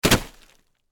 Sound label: Horror, Body, Fall, On, Plastic, Tarp; Blood and Guts; BlastWave